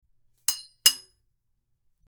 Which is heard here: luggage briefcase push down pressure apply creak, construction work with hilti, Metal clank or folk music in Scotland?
Metal clank